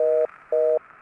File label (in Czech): tutut; Mafie 1